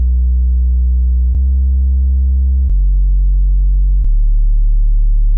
subbass; remixable collections

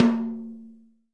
thin tom 8 A3; REAL LIVE KITS

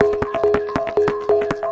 140 major5, synth and electronic loops

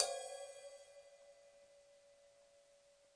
crash bell soft high; Crashes

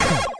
c64 sid snare, Commodore 64 8bit SID Drums; LO FI

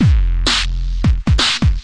DRUM LOOPS and BREAKS, 130 bpm; too dirty for words

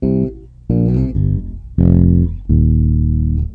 Fret bass lick; MELODIC SAMPLES

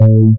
Syn Dr, Overloaded Synthetic Drums; style kits

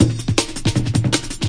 159 bpm movin jungle, drum loops and breaks; 141 to 160 bpm

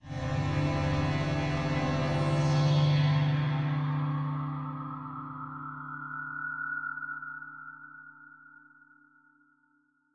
chimeverb thick harmonics; Atmospherics

